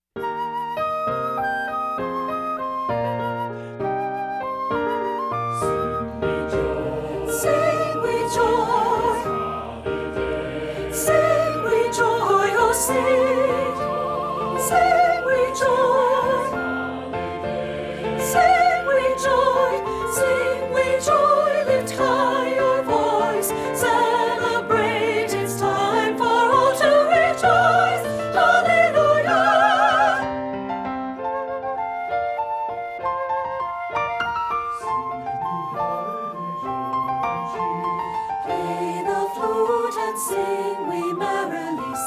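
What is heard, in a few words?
soprano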